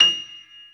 PNO F6, PIANO GRAND; Best Service ProSamples vol.55 - Retro Sampler; AKAI

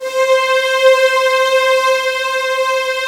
ANLG STR, ANALOG STR

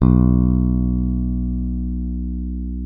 B1, FINGER MUTE; Xsample VOL-1

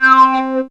syn c, Dance Synths; ProSamples vol.37

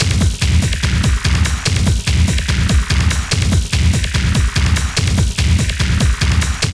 LOOP, Tekno Industrial; East West

